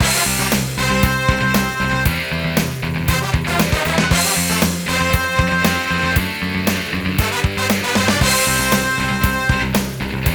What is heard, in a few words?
Match Won; super sidekicks 3 the next glory; Neo Geo CD